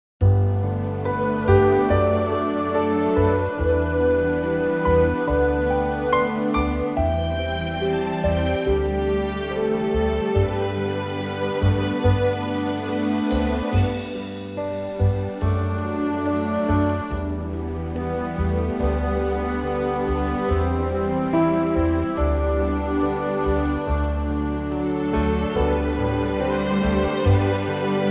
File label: G orchestra; orchestral demo 1